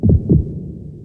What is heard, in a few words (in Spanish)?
corazon; RPG Maker; Legend of Heroen v1.20